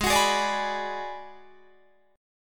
Ab Minor 7th Flat 5th Ab, down, Guitar, Drop D

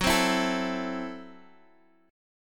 F sharp Minor 7th Flat 5th, Guitar Open F; F#m7b5 strummed; x,x,6,7,7,4; chord shape

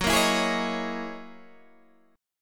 F sharp 13th F sharp down Guitar DADGAD

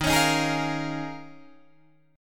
Eb 9th Suspended 4th Eb, Guitar DADGAD; strummed; 13,16,13,13,13,15

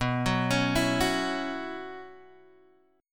B Suspended 2nd Suspended 4th, Guitar, Open G

